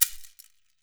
ganza; E-MU Producer Series Vol. 7 – Old World Instruments

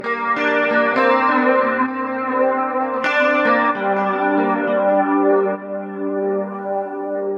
G Gtr Wavy Lines; Zero-G; Future Wave

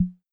Conga Lo, Yamaha EL-605 drums

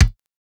Fairlight CMI III Kick

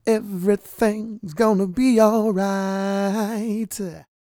SING, Vocal Planet R&B & Dance; ILIO

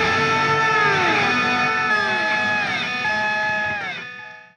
Clinical Slide Delay Guitar; Sonic Foundry (Sony Creative Software); Indie Rock Anthems; Session 02 - Clinical

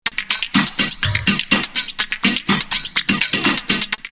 Rave drumloops 124; X-Static Goldmine; 90 s Sample CDs

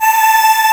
Piano, POP PIANO; AKAI S6000 CD-ROM - Volume 1

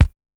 Kick Soft, dx

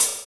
Hhat, HiHat; Equipped Music; Loungin House

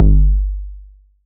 808 bass drum Tape Sat; Goldbaby Tape808 & Tape909; The Tape 808

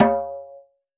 Dhol; Roland BK-5; Percussion; Dholak

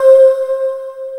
HI CHOIR

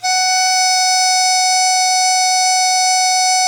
World Winds, Old World Instruments

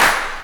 CLAPS HIP, CLP; Best Service Dance Mega Drums

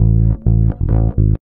REAL BASS, BASSLICKS; Ueberschall; Jam Box

